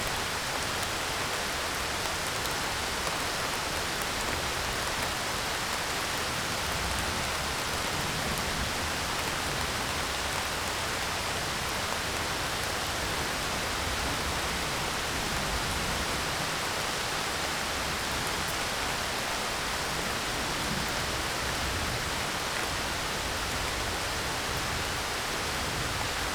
Alley Rain Wind

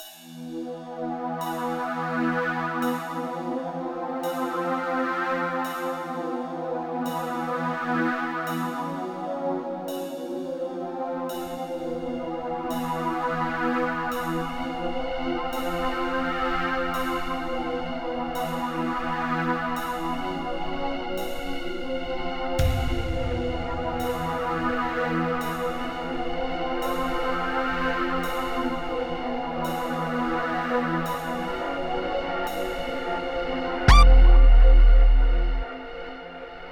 Drum n Noise

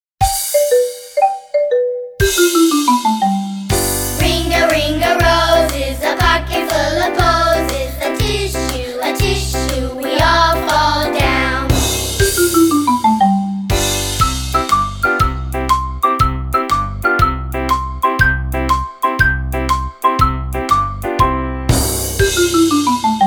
Singing Little Rascals, Ring-A-Ring-A Roses

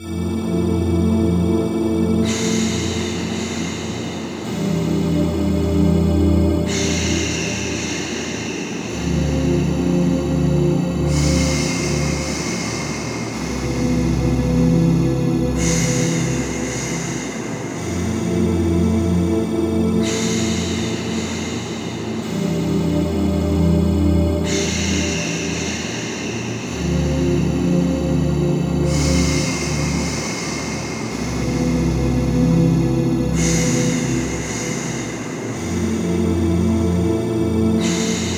White Noise Shhh

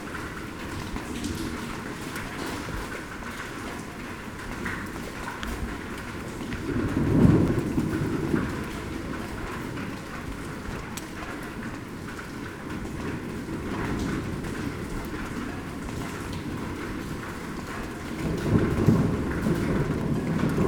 Sleep Sounds of Nature, Draining Rains

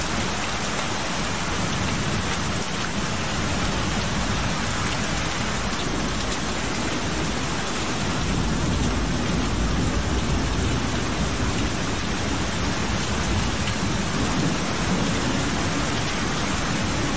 Forest Rain

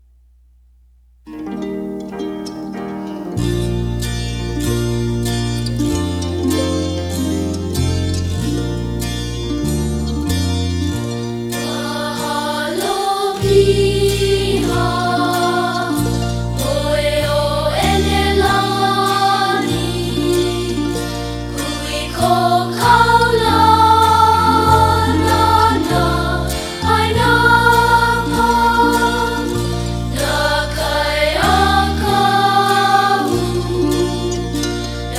Boy Choir; Honolulu

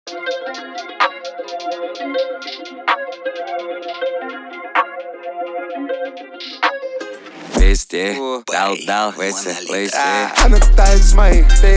33-37Hz Low Bass

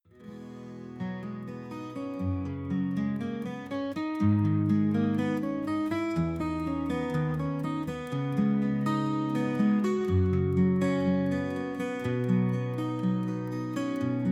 Instrumental Guitar, Acoustic Music

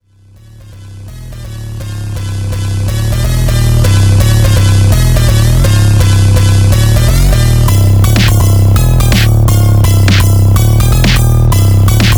Fonk Drift

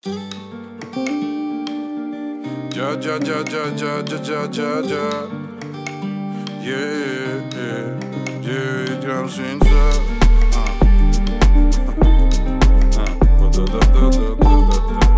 37.39.41hz Low bass

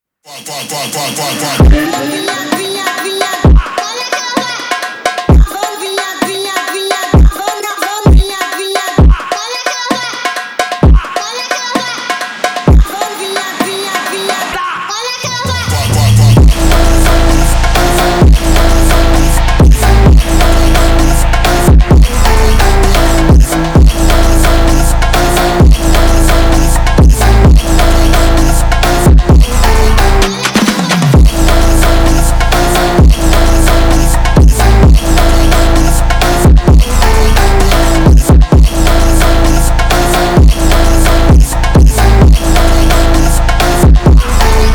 Brazilian beat

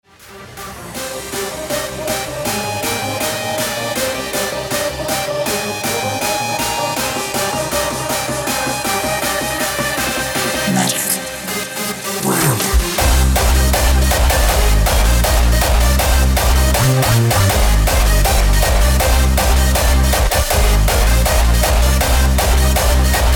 Jumpstyle

